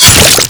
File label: melee katana; l4d2; talos axe; weapons